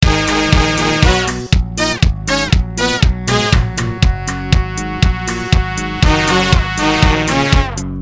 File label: depressing rock music